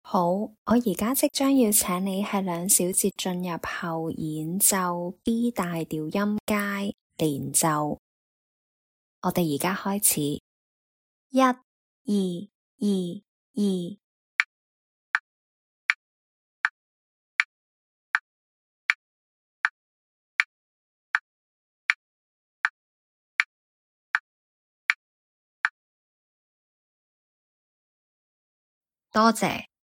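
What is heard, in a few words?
B major scale legato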